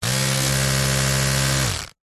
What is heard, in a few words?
household electric toothbrush on off short burst close up; zapsplat